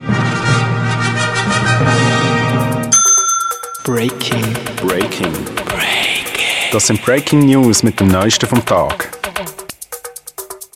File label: Jingle Breaking news